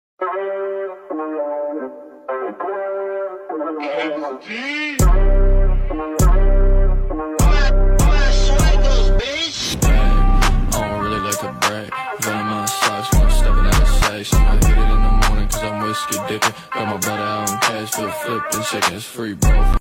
Fast Ringtone

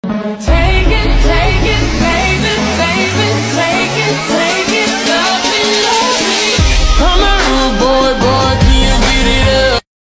English Ringtone

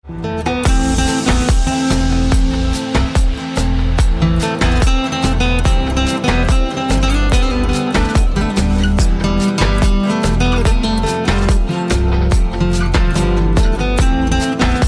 Guitar Ringtone

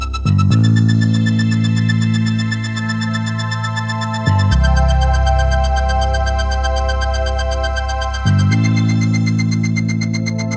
Vivo V15 Ringtone

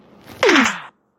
80s Toms Notification Ringtone; Samsung

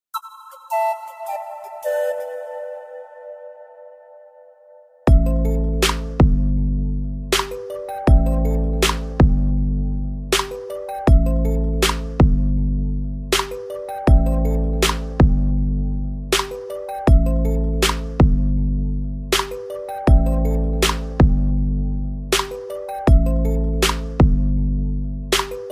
Love Beats Ringtone

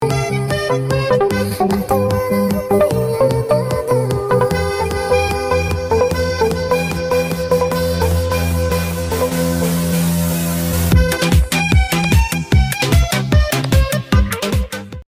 Love Remix Ringtone

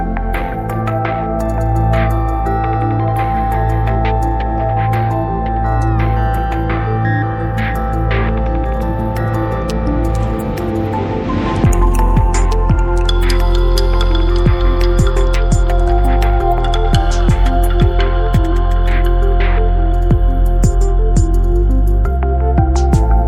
Huawei P Smart Default Ringtone

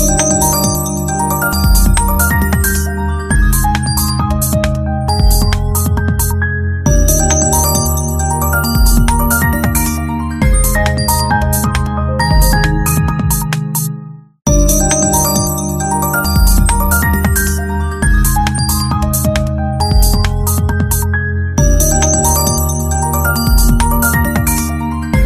Redmi Note 9S Xiaomi Ringtone

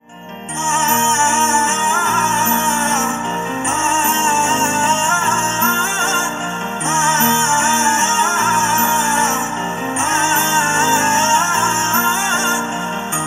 Punjabi Ringtone

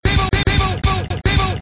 Notification Table Ringtone

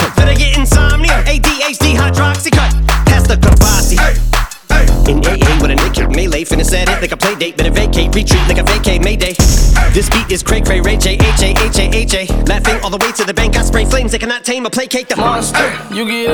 English Rap Song Ringtone

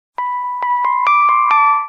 Soft Notification Ringtone; Oppo

